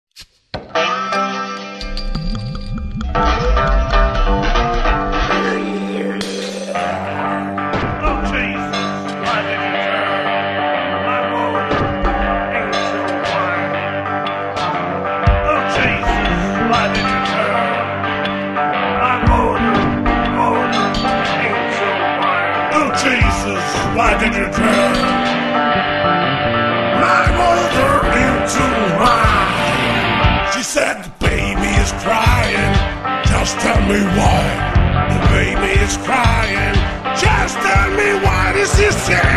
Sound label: Empty Bottle Blues